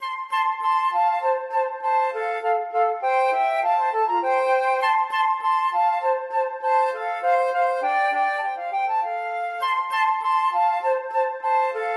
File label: duos allegro flute duet